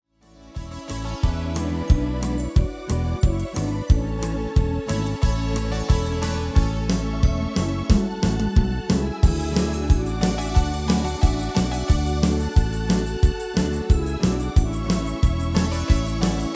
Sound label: MIDI